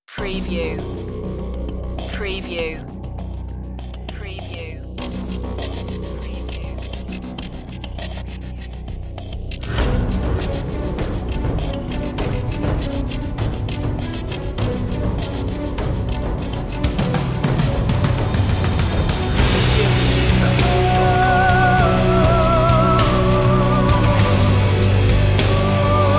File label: heroic anthem rock mix